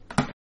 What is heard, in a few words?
button push